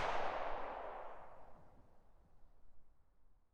tail pistol shot, dry; weapons; Half Life Alyx

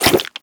breath out, headcrab black; creatures

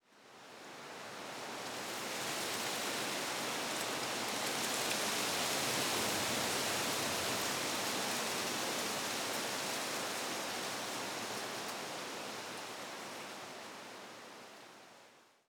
wind tree; ambient; nature

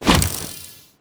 bump mine throw; Counter Strike Model Pack; CS:GO; survival

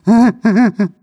breath long, zombie

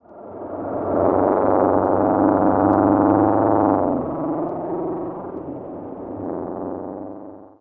teleport weird voices; Half Life Model Pack; Half Life 2; ambient levels

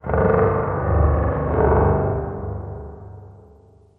amb gen low metallic, ambient; Half Life Model Pack

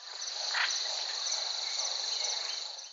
shoots jungle bird

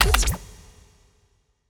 music equip

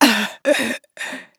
death fem, player

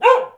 dog med inside bark; ambient animal; Counter Strike Model Pack; CS:S

